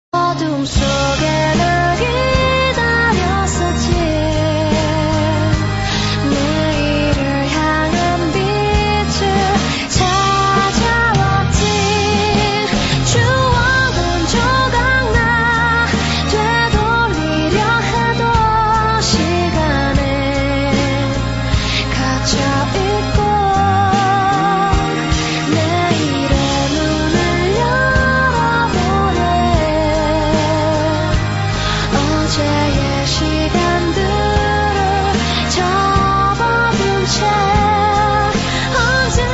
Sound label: sad melody